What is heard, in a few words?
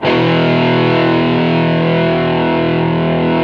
GTR E MAJ, ROCK GUITAR; Greytsounds VOL-1; ROCK GUITAR1